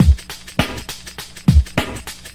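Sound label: tambourines, Boombap Drum loops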